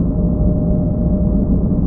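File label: cargo bay ambience; trek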